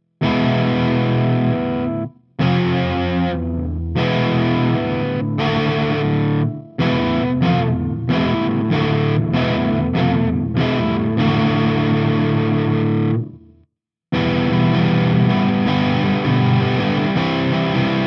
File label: EGuitar solo